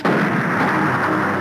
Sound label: Explosion; Tom Jerry